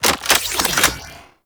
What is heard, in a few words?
Hook Sword Equip; Archwing; Melee